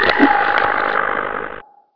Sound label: attack; mystic radar; mr zombie1